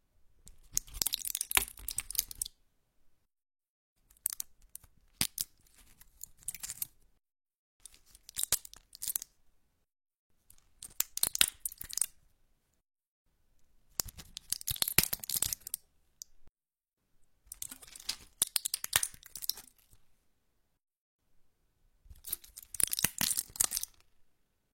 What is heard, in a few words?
Pills Taking them out of the foil package; Pills Sound Effect